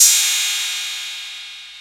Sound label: CRASH CYMB; E-MU Formula 4000 Series Vol. 2